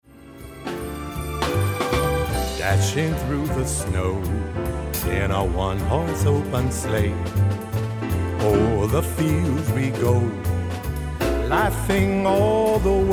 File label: Jingle Bells